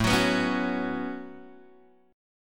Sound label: A 6th Add 9th A, guitar chord; A6add9 Chord {5 X 4 4 2 2} strummed